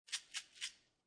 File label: Pepper Shaker Shaking, Household, Pepper Shaker, Shaking Out Pepper, Three Quick Shakes; Kitchen